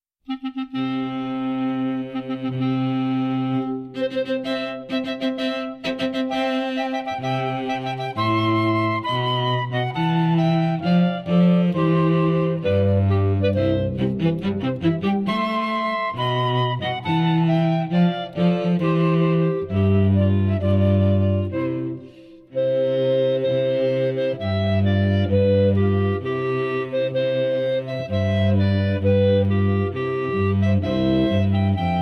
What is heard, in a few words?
wedding march